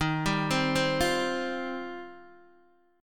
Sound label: D sharp 6th Add 9th, Guitar, Open D